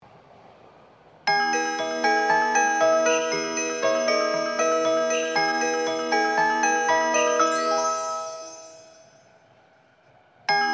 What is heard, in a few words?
melody; sakuragicho